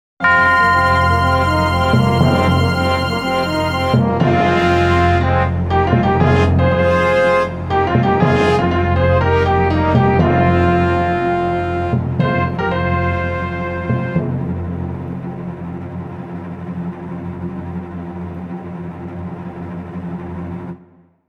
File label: A Very Expensive Forest, instrumental; Spamalot; Portland Drama Club